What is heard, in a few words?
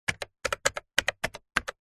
foley cash register electronic buttons press sequence; zapsplat